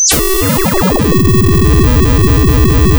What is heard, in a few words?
pop